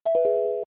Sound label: Call End ear; LG SB260